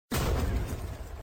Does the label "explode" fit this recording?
Yes